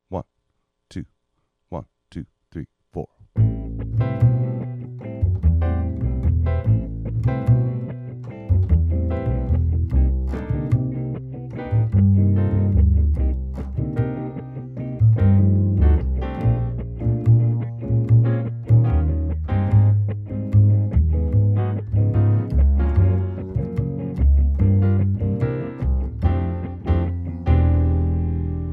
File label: latin rhythm section drums muted